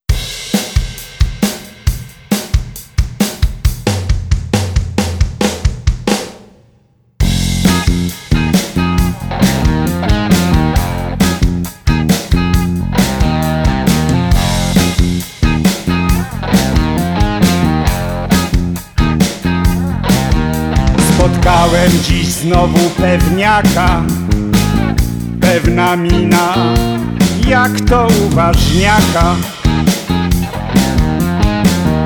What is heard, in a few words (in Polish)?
Niepewny Blues